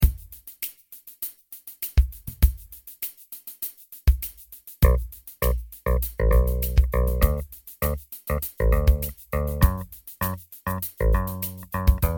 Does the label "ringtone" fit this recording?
No